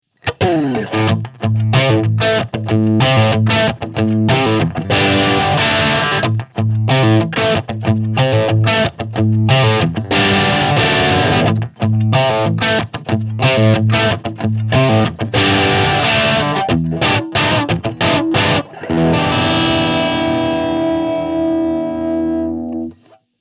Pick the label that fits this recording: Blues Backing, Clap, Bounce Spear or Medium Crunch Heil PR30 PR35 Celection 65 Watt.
Medium Crunch Heil PR30 PR35 Celection 65 Watt